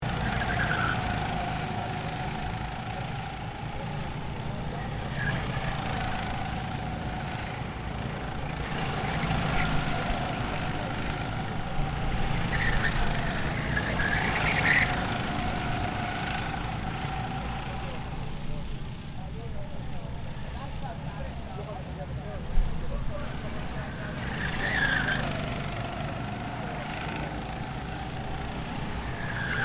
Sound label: Grill Kart